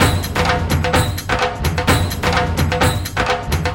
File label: Latin House Loop 128 BPM; Vengeance Samples